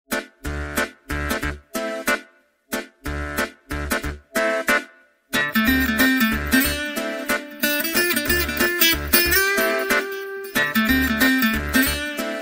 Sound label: BGM Telugu; Maharshi